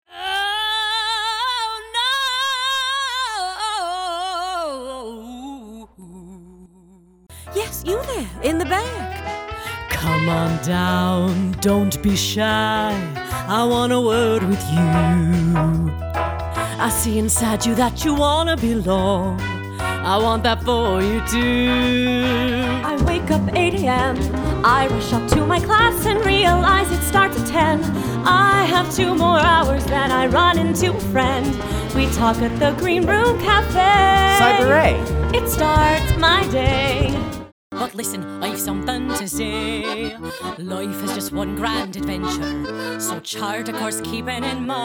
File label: Anim. Singing VO